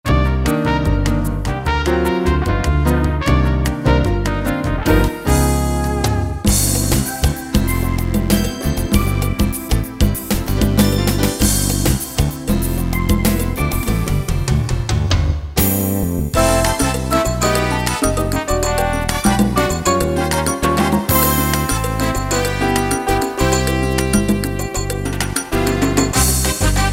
Latin, Roland EM-10

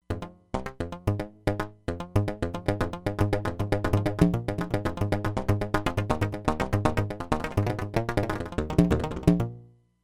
Plexi Delay, E-mu Proteus-3; Factory patches

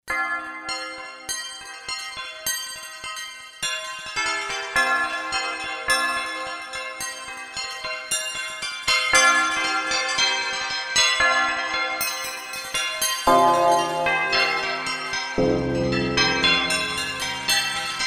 3D Carillon; Roland SC-880; Factory Patches

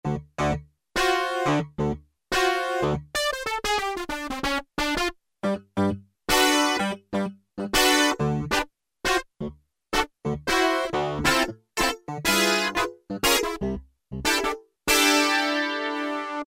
Casio VZ-10M; Internal factory combi

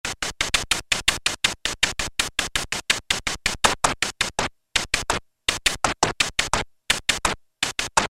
SHAKER, Ensoniq ESQ-1

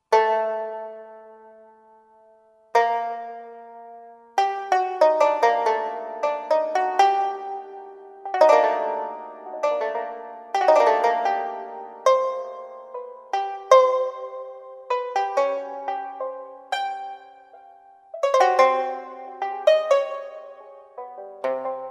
Pi Pa, Roland SR-JV80-14 World Collection Asia

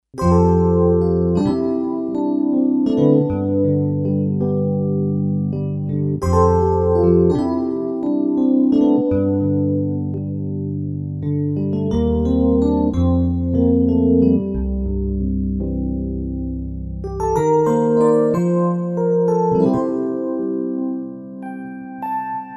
FM PIANO

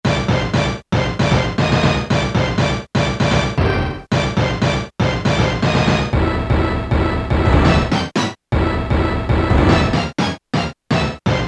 ORCHESTRA HIT, Casio Rapman; Tones

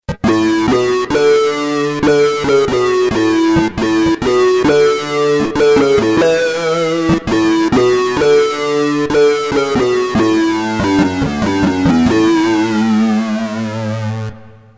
Car's Sync; Kurzweil K2000 V3

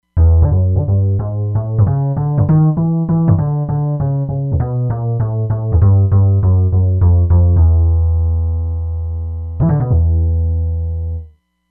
Downright Bs; Roland Fantom XR

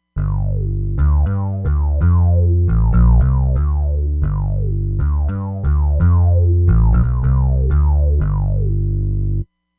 Secret Bass

